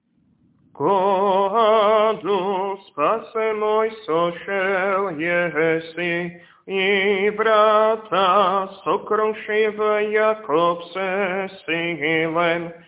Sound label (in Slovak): Kondák voskresný hlas 5; nedelna-skola